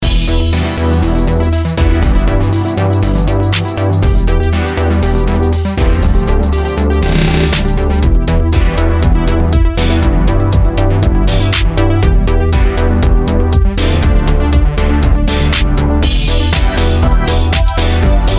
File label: beat; bpm:120